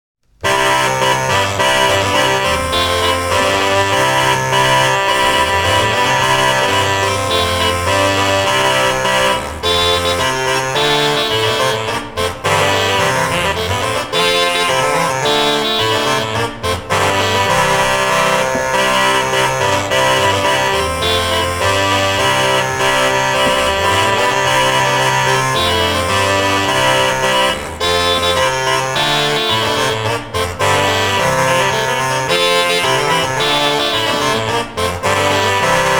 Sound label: intrada portativ; konecny